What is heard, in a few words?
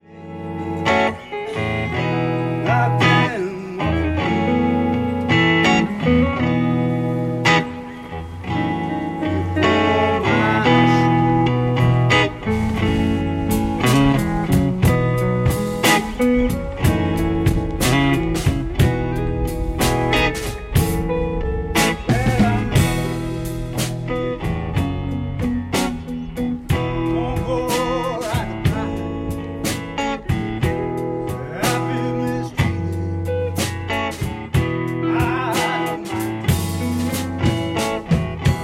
Walking Blues